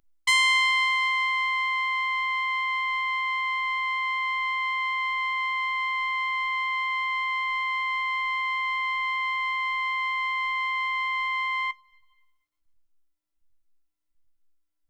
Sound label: toranpetto high